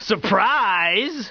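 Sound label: surprise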